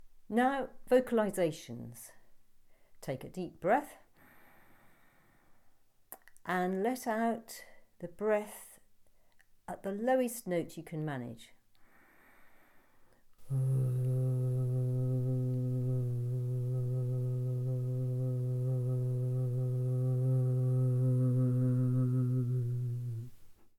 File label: Low hum